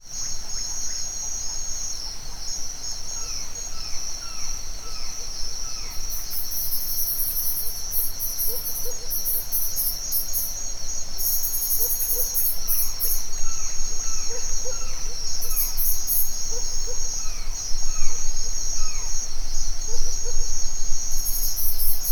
nature ambience forest dawn owl birds crickets insects mexico; felix blume